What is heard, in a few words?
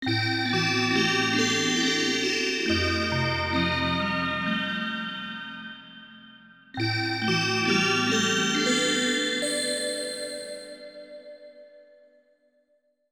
Haunted Mansion Organ; Shin Megami Tensei Devil Summoner Soul Hackers; 3DS; Haunted Mansion Organ -Alt 2